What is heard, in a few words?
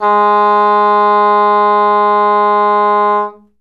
oboe sustain